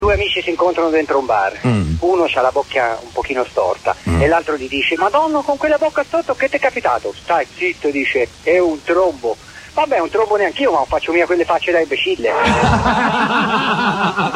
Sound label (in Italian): TROMBO; TROMBO o TROMBO? da radio105